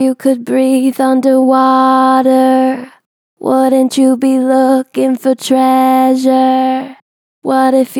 Gentle Vibrato "What if you could breathe", Vocals, Natural Vibrato Gentle; Harmor Vocals